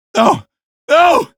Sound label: die male